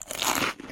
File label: human eat potato chips